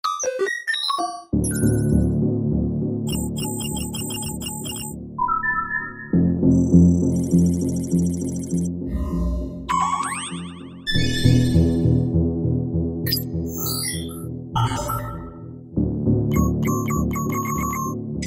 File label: Futuristic Computer; interface alerts